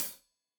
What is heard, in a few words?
Hat Tight, Hi-Hat; Deeply Sampled Drums